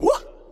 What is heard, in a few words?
VOX